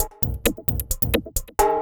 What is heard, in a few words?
HiHat Perc Loop, Dance Beats